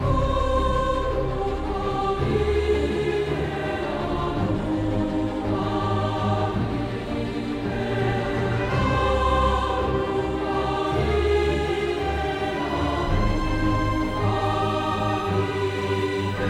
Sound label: choir battle track